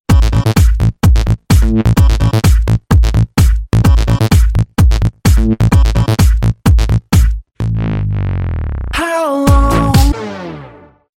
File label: house music ringtone